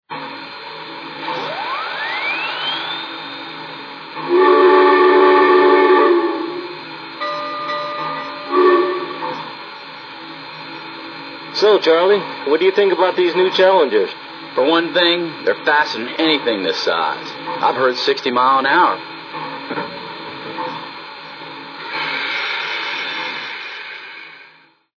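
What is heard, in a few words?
Challenger, trains; FRT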